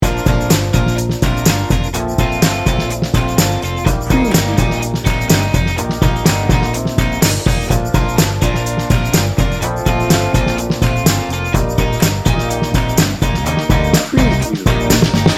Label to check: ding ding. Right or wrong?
Wrong